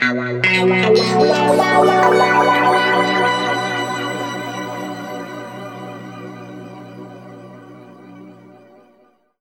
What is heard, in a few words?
B P-S WA -L, Guitar; East West; Steve Stevens Guitar Samples Collection; PTCH-SHFT+WA